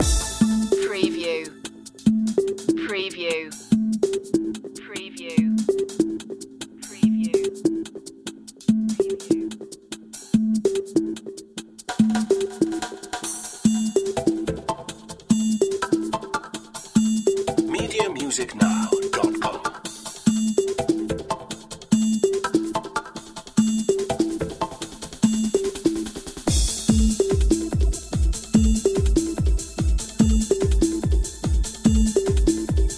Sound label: electromanics loop